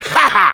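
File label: laugh short, French; Rire court 1; Demoman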